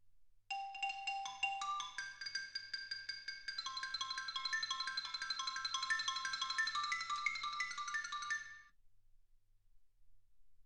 xylophone

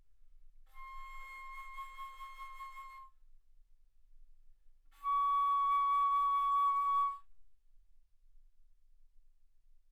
Bass Flute C6 Db6; ff

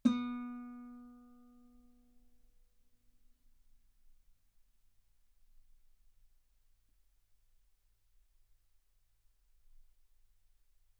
Guitar ff sul B B3; single mic

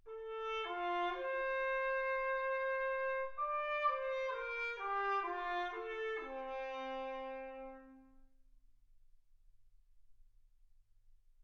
trumpet

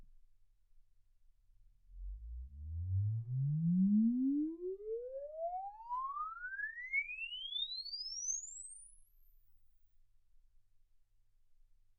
exp swept sinus 10Hz 24kHz

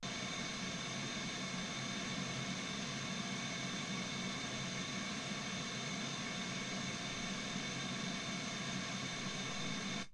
wgn

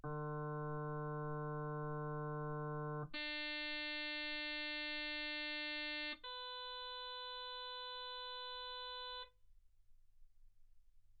harm sinus 48kHz

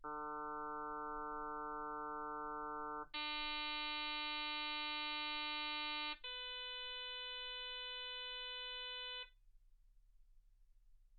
harm sinus 48kHz

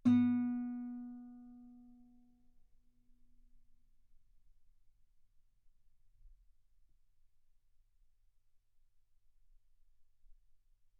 Guitar B3

